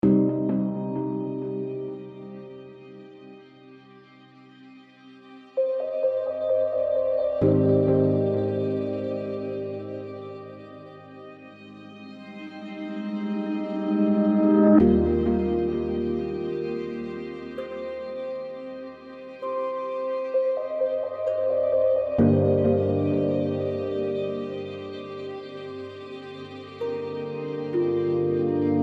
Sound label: pan drum cycles